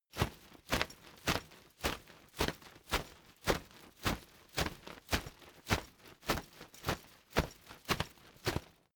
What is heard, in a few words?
umbrella big shake slow hard